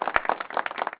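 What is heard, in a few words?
winner, mahjong; Mahjong Medley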